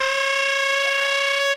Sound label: trill, Horns; Notes